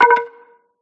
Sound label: Sfx Baseball